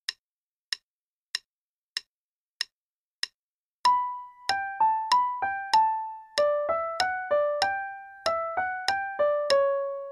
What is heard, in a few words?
Minuet in G Major (2nd page RH only, qn=96); Bach